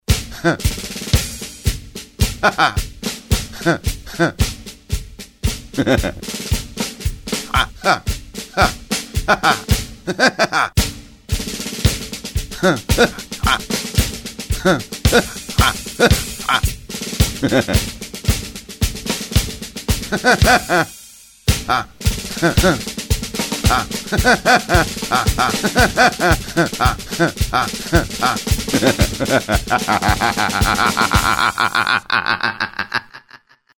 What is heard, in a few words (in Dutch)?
ritmisch lachen